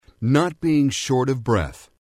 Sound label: short of breath